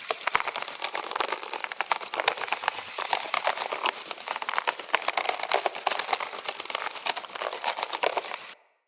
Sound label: Squid Plant Flight Loop; Traps